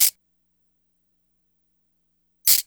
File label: RATCHT